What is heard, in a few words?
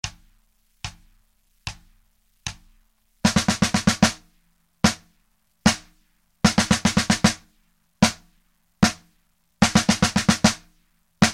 Sixteenth note triplets